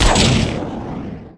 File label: rockets; lander